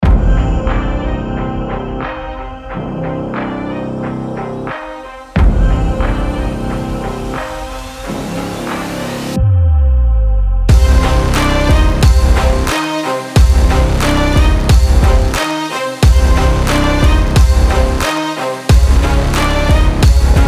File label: fashion dramatic sport big beat